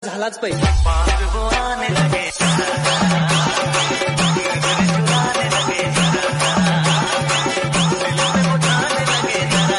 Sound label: Hindi bhaigiri Ringtone